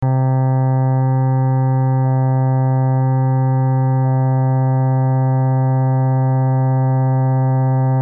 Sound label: cello